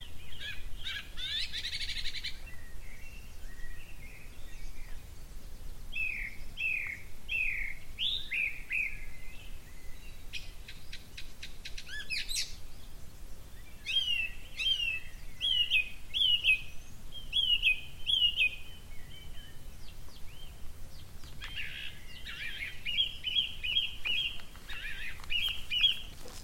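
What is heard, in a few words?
flute; floeter am morgen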